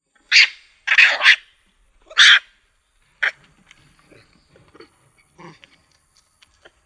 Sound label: Jungle Animal Monkey Long Tailed Macaque Shriek Talking; Nature; Monkey Sound Effects